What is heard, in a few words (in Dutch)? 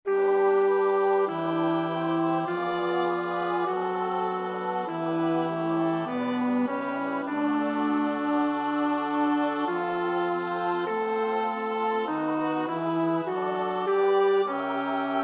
bas, koorpartij oefening; Reger (Max); Meinen Jesum laß ich nicht